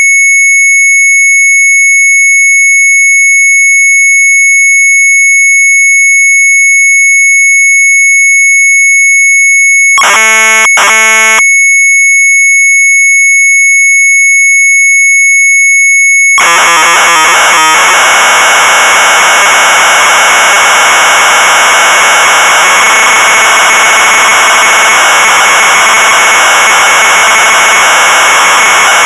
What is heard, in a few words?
Sharp MZ800 Tape; TANK-SLAG; retromelsarchive